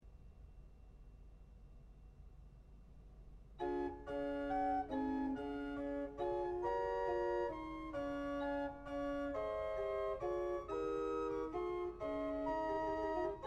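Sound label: Corrente Prima, organ; 1831; Spada; Creccio; Pesenti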